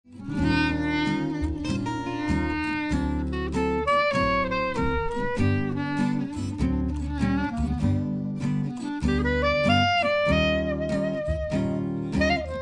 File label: clar